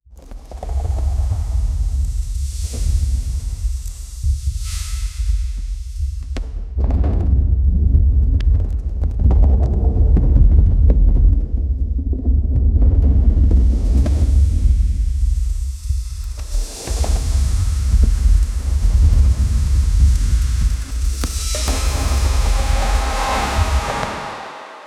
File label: thunder deep bass